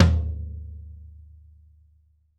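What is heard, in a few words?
floor tom; 90s sample CD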